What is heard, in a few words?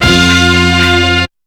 shot; BEAT2000